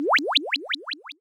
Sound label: Cry